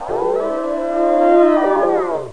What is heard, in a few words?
howl; duke nukem total meltdown